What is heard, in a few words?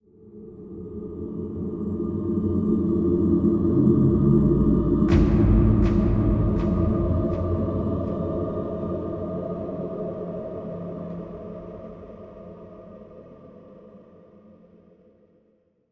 Music Shorts